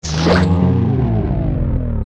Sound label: ReDead Die; zelda; OOT